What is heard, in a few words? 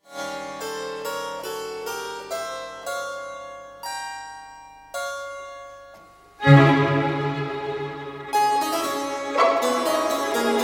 harpsichord concerto; frigyes hidas